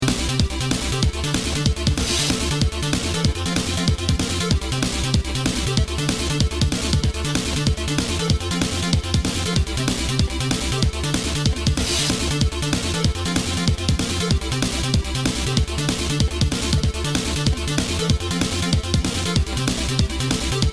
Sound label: 8-bit horror